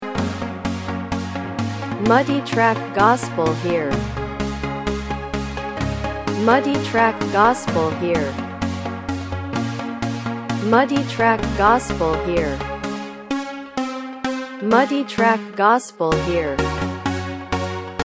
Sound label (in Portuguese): Synth Base